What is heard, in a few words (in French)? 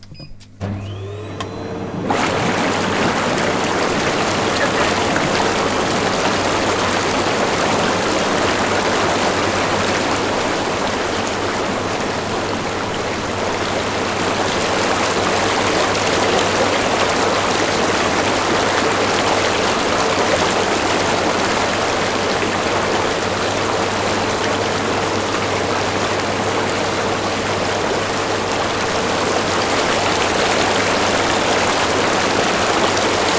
jacuzzi